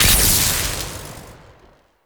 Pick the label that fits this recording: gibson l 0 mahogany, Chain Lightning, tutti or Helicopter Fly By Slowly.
Chain Lightning